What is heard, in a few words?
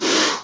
asp angry